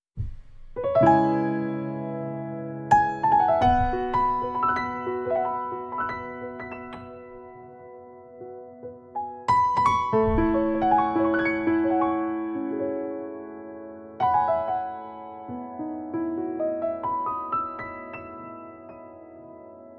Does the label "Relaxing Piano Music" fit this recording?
Yes